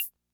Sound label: Perc, rhythm330; classic machines